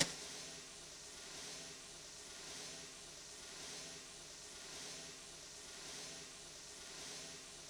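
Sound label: Brush Swirl High, Electric Snare, Yamaha DTXpress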